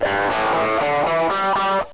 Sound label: GUITAR